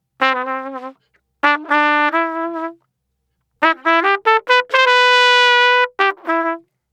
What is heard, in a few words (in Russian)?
Trump Solo C, сэмпл трубы диско хаус; trumpet solo